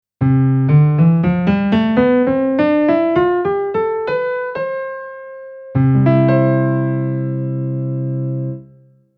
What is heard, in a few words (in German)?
rein tonleiter